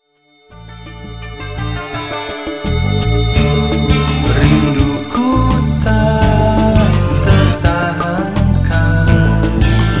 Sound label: Sitar